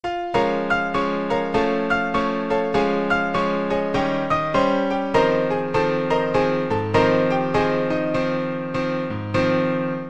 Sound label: lick, piano